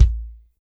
Kick, Kick Loops

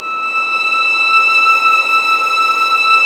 STR VLS, Vlns p%mf

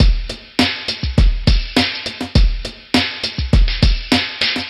Drum Loop, Drum Loops

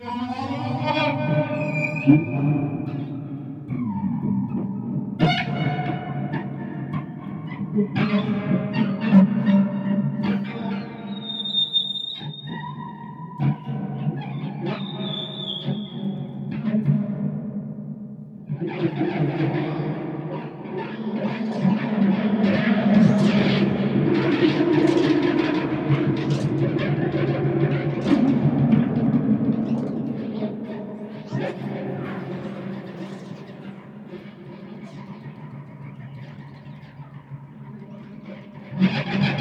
Squeak D#3; Zero-G - Ghost In The Machine

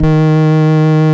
VOX EB2, Vintage Keyboards; Big Fish Audio - First Call Vintage Keyboards

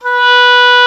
Oboe mf B3; Digital Sound Factory Virtuoso

